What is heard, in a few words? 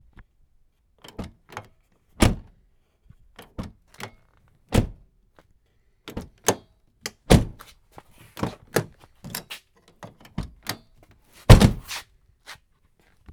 car door